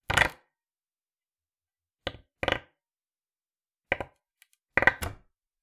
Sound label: Palette, WoodenPalette